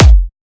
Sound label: new kick